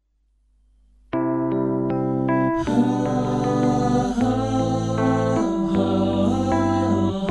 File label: rap; viet rap